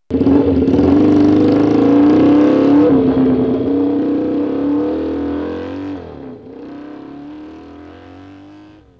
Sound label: MOTORCYC